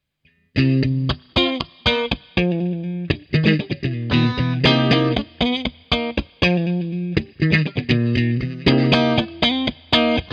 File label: strat twin; squier classic vibes